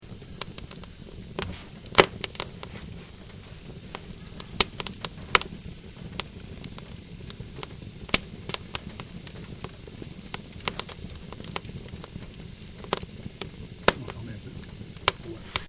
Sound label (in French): feu